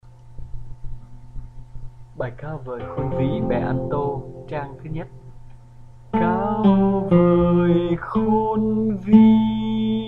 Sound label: Alto